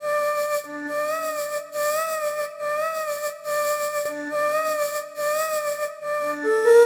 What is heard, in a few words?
Flute Noisy 140BPM Gmin; 99Sounds x Monosounds; Instrument Loops